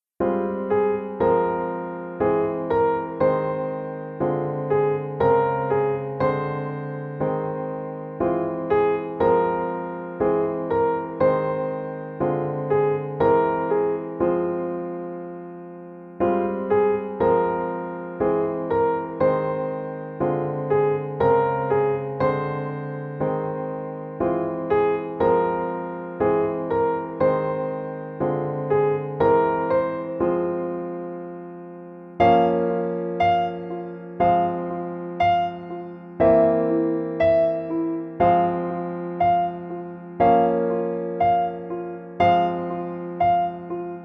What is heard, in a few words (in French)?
Piano seul